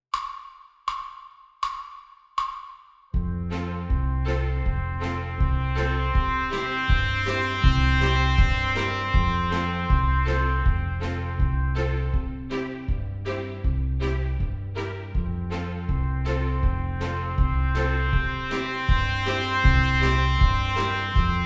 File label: Crescendo Diminuendo Horns in Fifths